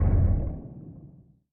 Menu Inspector Window Close; Custom UI Sounds; Dying Light

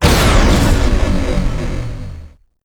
Quest Failed; Custom UI Sounds; Call of Duty Black Ops 2

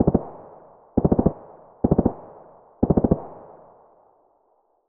hk416 sequence; weapons; SPT Battle Ambience

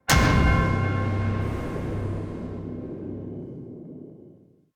Quest Started; Resident Evil 2 (2019) Retro

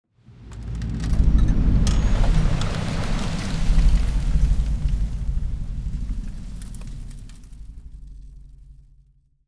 Quest Started; Custom UI Sounds; Fallout New Vegas

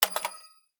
Menu Trader Press; Custom UI Sounds; Call of Duty Black Ops Cold War

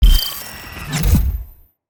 Achievement Completed; Custom UI Sounds; Call of Duty Black Ops Cold War

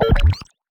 Menu Inspector Window Close; Custom UI Sounds; Slime Rancher

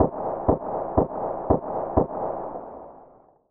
scarh tap fast, weapons; scar heavy; SPT Battle Ambience

